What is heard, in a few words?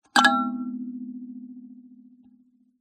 musical karimba tine strum